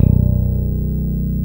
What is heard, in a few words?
5 STR P BS B1, FINGER BS